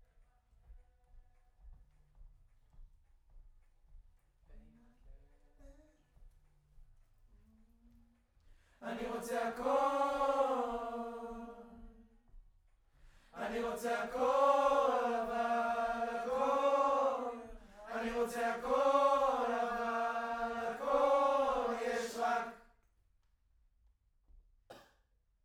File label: Choir Far